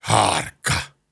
Grunts and Chants, Viking Vocal

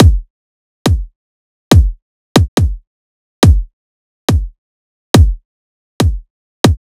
Riddim Drum Loop 140 Kick

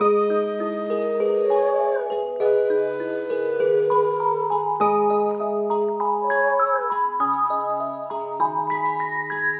Dark Melody Loop, 100 BPM G# Min; Cymatics; 2020 Melody Collection

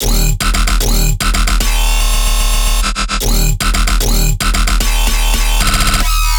Bass Loop D# System, Dubstep, 150bpm; Ghosthack; Dubstep Sound Arsenal

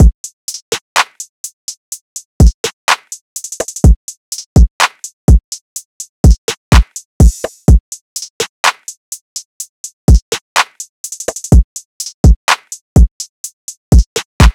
Drum Loop - 125 BPM, trap; Drum Loops; Champions Drum Loop; Cymatics; Trap Starter Pack

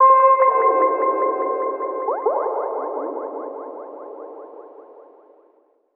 SYNTH (C); One Shots; Mission Control; Cymatics; Mystery Pack Vol 4

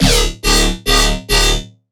Riddim Bass Hit D; One Shots; Artificial; Ghosthack; Riddim Essentials 2020

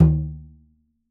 Rebolo, World Percussion; Cymatics; Emperor World Percussion

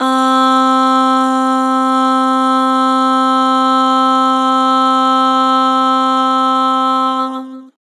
"Aah", Harmor Vocals

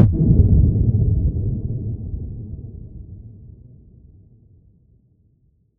Deep Bass, Hits

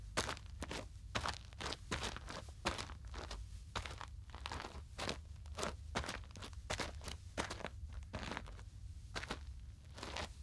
Boots On Sand Footsteps; Fairlight Sound Lib

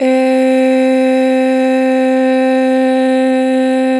Long Eh, Vocals; Harmor Vocals; 2 bar flat; Pitch Flat

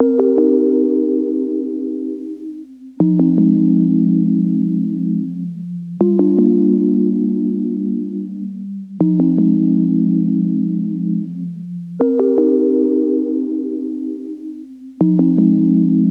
80 BPM C Min Pluck; Lofi; Bordeaux; Wisdom Pluck

